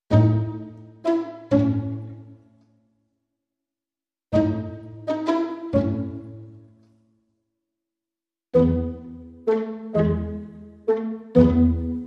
Modern Percussion No Percussion

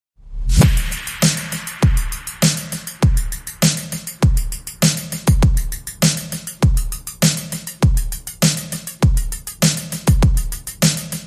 Drums Only Mix

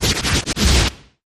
Broken Noise Sweep

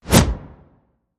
Faster Than Wind Flyby

